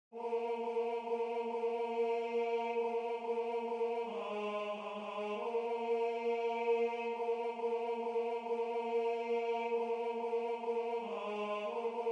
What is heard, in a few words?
Tenor